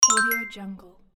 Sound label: achievement